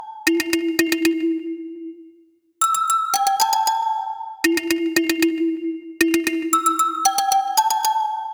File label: 115 E Seq Micro Keys; Zero-G; Future Wave